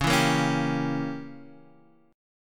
C sharp Diminished 7th C sharp

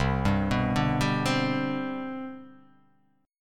C Major 7th Suspended 2nd C down, chord; CM7sus2 arpeggiated; JGuitar

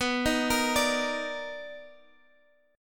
B Major 7th Sharp 9th B; Bmaj7#9 arpeggiated; JGuitar

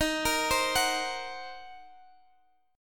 C Minor 7th Flat 5th Eb, chord

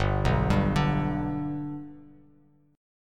G Major G down Bass Standard; G arpeggiated; JGuitar

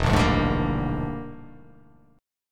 E 7th Sharp 9th Flat 5th, chord

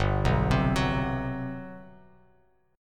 G Major 11th, Bass Standard; arpeggiated; JGuitar

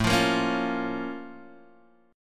Bb Minor A; Bbm/A; strummed; JGuitar

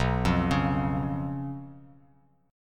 B Suspended 2nd Flat 5th B, Bass Standard; Bsus2b5 arpeggiated; x,2,3,6; JGuitar

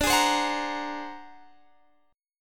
Ab 9th Flat 5th D

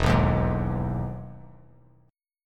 E Major 7th E down; EM7 strummed; JGuitar

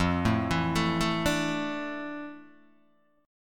F Major 13th Guitar Open D

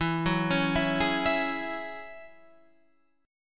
Gb 7th Suspended 2nd E

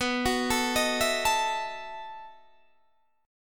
B 11th B Guitar DADGAD, chord

